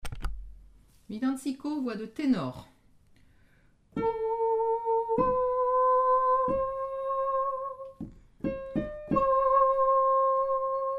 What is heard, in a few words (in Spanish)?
villancico ténor